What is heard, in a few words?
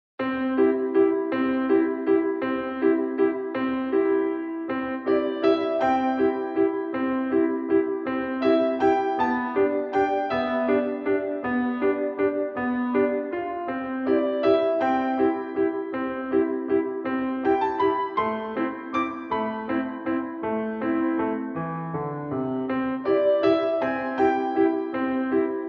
Cat Walking(160)